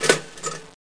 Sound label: toaster; InventorLabs Technology; EDISON